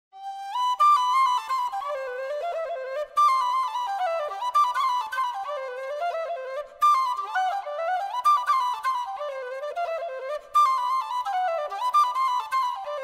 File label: Whistle G Reel